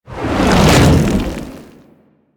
Infested Bone Blade Melee Swing; Warframe

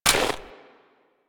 Step Ice, footsteps; Warframe; Grineer Royal Jester; Foley

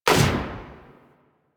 Protea SMG Shot B

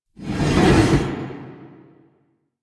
Jump Slam Foley; Warframe; Veilbreaker; Sprag

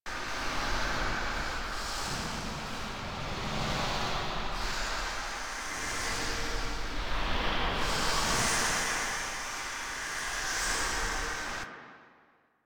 Guardian Shell Loop; Warframe; Focus; Vazarin

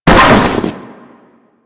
Fall Metal; Foley; Warframe; Tenno

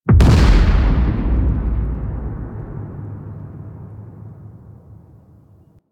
Queens Chamber Build Up Blast; Warframe; The War Within

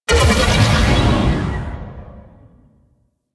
Shield Cast; DE; Warframe; Weapons; Archwing; Powers

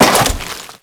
Crewman Step Goo, Footsteps; Warframe; Corpus

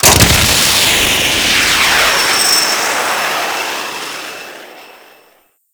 Jet Pack Lift Off; Jet Pack Marine; Warframe; Grineer

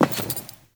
Walk Metal, Footsteps; Characters; Enemy; Lady Deora

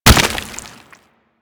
Golem Gun Projectile Hit; Warframe; Attacks

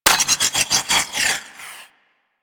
Bard Rifle Reload; Weapons; Warframe; Tenno

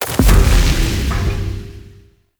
Metal Crate Destroy; Objects

